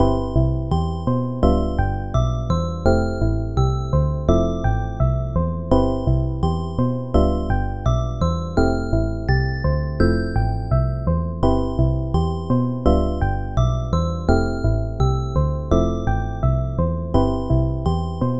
lullaby

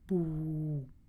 UI Sounds Boop

